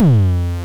FM Kicks Distortion F2; Drums; LookIMadeAThing Kicks - Vermona Kick Lancet

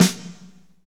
SNR, Snares, Roland

